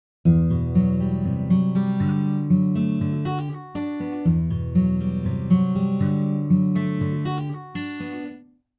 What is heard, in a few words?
Arp; Ample